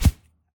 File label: sand hit; hits